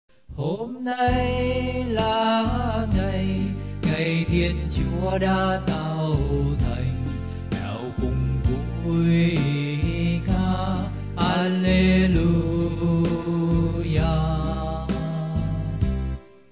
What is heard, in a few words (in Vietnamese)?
ALTO